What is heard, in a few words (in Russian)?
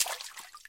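слабый негромкий плеск воды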